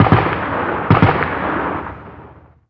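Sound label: gun 50cal distant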